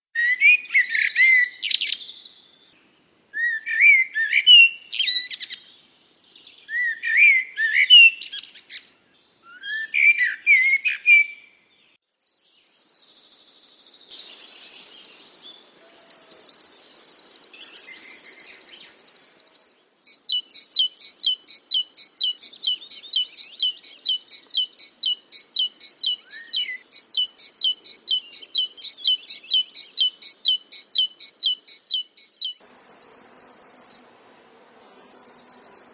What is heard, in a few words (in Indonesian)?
masteran untuk lark